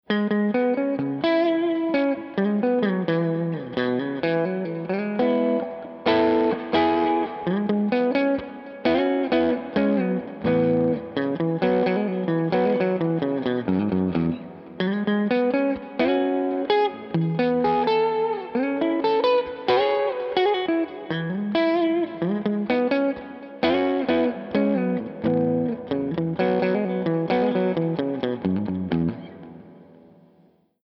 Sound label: Mesa Dual Rectifier Roadster Drive